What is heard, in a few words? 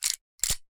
ar slide